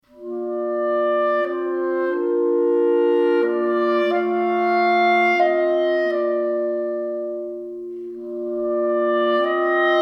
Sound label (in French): trio pour clarinettes; vignon denys